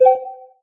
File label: cards take trick; uisounds; cardgames; There; ThereClient